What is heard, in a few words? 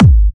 Trance Kicks, Bassdrums; Vengeance; Essential Clubsounds Vol.4